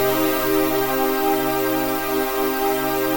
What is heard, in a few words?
CHRD PAD; Best Service; XX Large Pads; Sample CDs